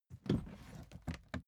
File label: suitcase open case quick